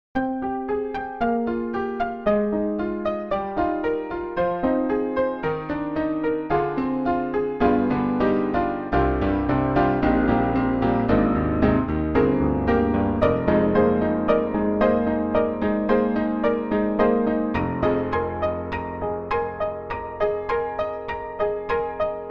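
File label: Piano Broken Piano Distorted Piano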